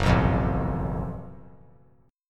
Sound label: Gb Minor Major 7th Double Flat 5th, chord; strummed; 6,6,8,7; JGuitar